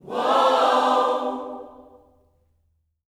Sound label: WHOA OHS, Vocal Gospel; Vocal Planet Vol1 Gospel